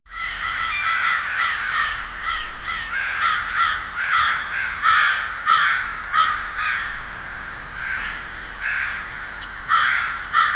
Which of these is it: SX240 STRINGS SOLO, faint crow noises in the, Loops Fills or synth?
faint crow noises in the